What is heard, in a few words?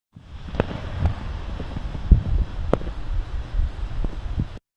fire; Сильный звук огня